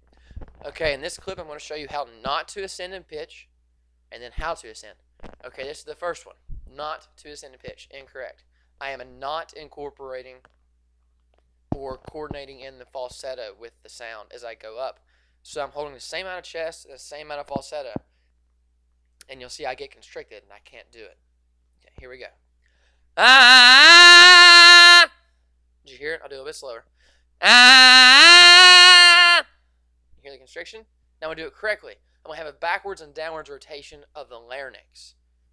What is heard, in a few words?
Going Up In Pitch